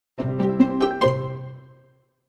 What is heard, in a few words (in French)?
SONNERIE; pySonnerie